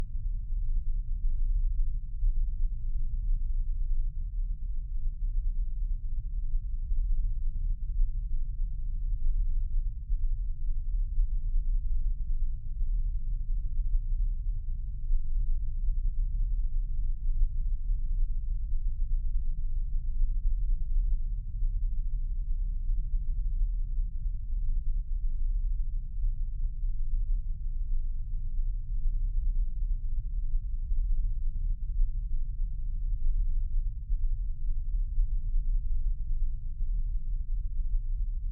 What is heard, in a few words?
Low Rumble Loop, Sci-Fi Sounds